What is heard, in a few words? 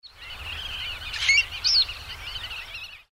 stone curlew